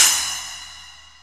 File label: Splash Cym; 300 Drum Machines; E-MU MoPhatt